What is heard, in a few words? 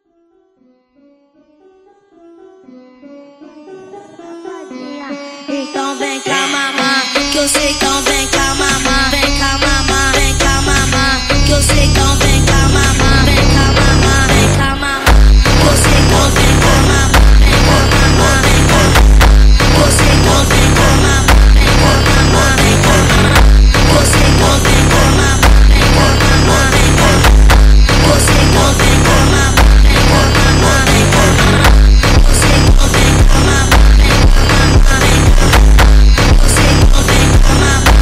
Arabic Funk slowed